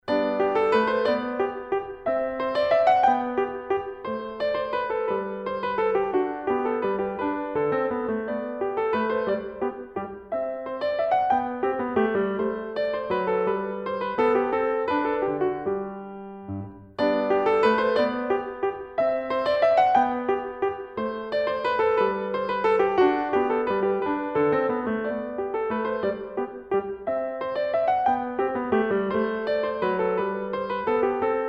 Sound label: Bach Menuet in G majeur